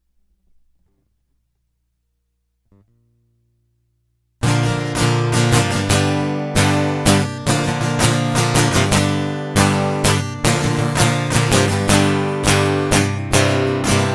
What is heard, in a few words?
Guitar Loop